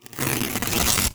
Rip, cartoon Foley Fx; musicradar; cartoon caper

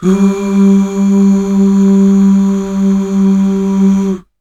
UUH

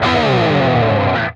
PRS SLIDE, guitar

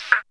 synth, g#, Rave Synths; Best Service ProSamples vol.37 - Dance Synths

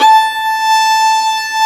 violin A4, Orchestral; Violins

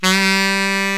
SAX COMBO

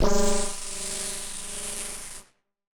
Casio, Glitched, malfunctioning synth

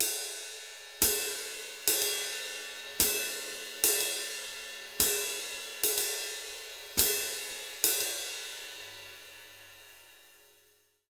RID, Jazzistic, 060; Univers Sons

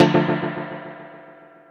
Synth Pattern; Electrocution

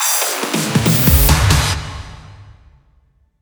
Fills, Loops 140 BPM

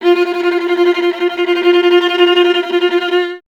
viola F; Miroslav Vitous; Solo Instruments VOL-1

